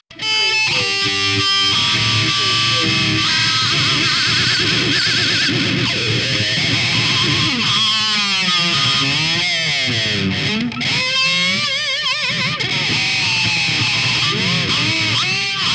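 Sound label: Lp Insn Dist Guitar Solo C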